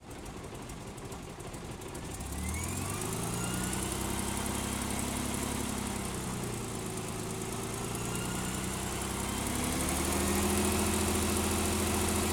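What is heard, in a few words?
spitfire mk ix onboard idle blips engine, Supermarine Spitfire Mk IX 1943; DPA4062; Pole Position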